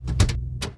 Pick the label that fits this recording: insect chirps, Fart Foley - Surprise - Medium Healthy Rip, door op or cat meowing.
door op